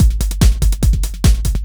Beat, Drums & Percussion; Electrocution; Beats

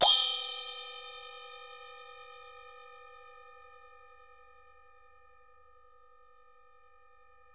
HSIAO CHIN, CHINESE CYM; AKAI S6000 CD-ROM; WorldSounds

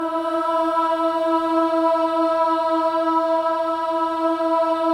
BOYS AHS, Symphony of Voices

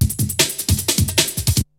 BREAKBEATS, TECHNO

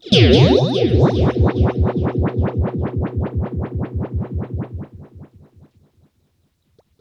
RIPPLES L, Funk Guitar; 90 s Sample CDs; Zero G

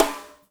FUNK SNR; Spectrasonics - Burning Grooves CD2

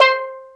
sfx perc

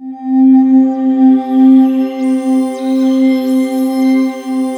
WS NL C 4-L, Korg Wavestation; Back In Time Records; Korg Universe VOL-1

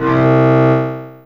Bowd Bass C5, Fairlight CMI IIe Lo String; 11LoString

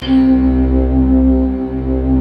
baroque C2; East West; Global Sounds

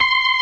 STOPS, ORGAN

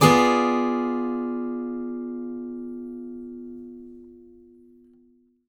ACCGTR DIMCD, Whole Lotta Country

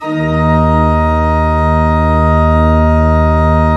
D1, Cathedral Organ; ILIO; Propeller Islands Cathedral Organ

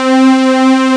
JUPITER STRNG; CMP - Analogon

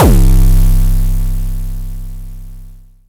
boom, Kicks; ModelCycles

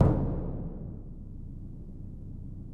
BD m Hit Loop; Digital Sound Factory Virtuoso

